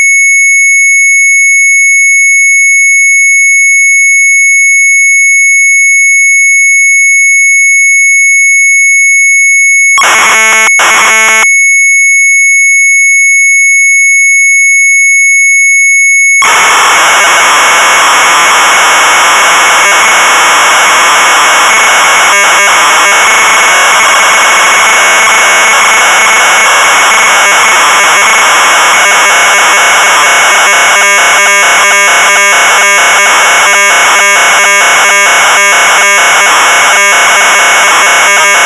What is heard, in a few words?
Sharp MZ800 Tape